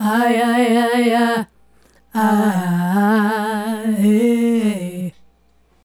Female Group, Hip-Hop and RnB Vocals

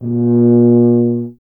tuba; Miroslav Vitous - Solo Instruments VOL-2

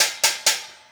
Hi Hat Pattern; Essential Percussion; Hi Hat Patterns

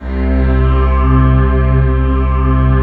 piano C2; ELECTRON

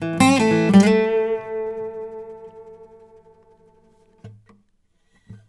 BARI LICK, Blues Guitar; ILIO; Fretworks; 108 BARI LICK

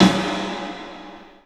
PA DEEP R, CLUB SN VRB; 3D Sound; 3D Drums

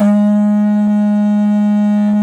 HORN, Didgeridoo and Other Primitive Instruments; Big Fish Audio; DIDGEHORN1

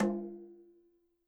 KILLER CONGA

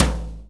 TOM E, Drum Dry; East Collexion; Drum 1

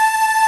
Ending loop

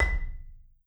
SOFT B5, Marimba; Sampleheads; Dave Samuels Marimba & Vibes

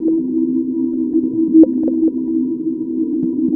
BASE, ELECTRONC

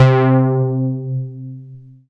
JUNO, Synth Bass; SYNTH-Basses; Sound & Vision; Gigapack I CD 2

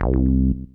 Synth Prophet Bass C; One Shots; Electronisounds

